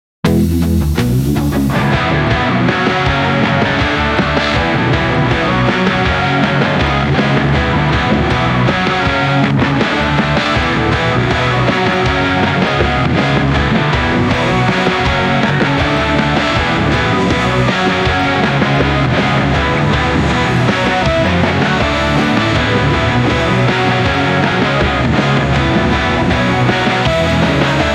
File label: trap pop rock love sad